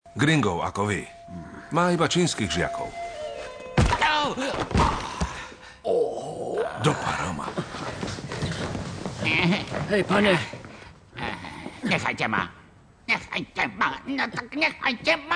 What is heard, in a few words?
ping pong